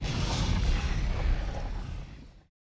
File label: Lava Hit